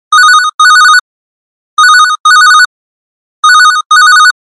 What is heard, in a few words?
High Frequency Sound; Coolpad; ESurfing-No1-2021